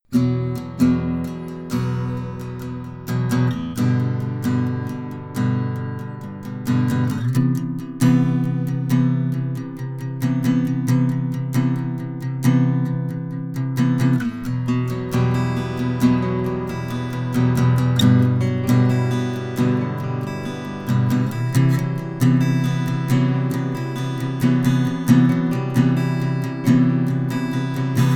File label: campfire quiet